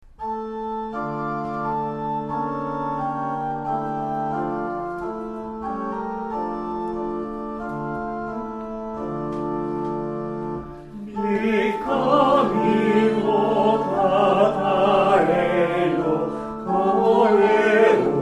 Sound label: shalom chor practice